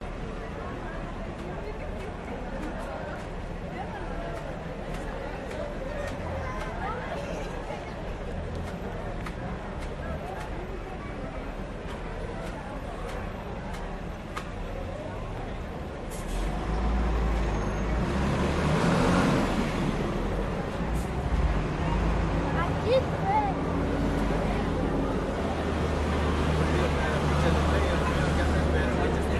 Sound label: street traffic woman yelling walla people chatting; la paz; your free sounds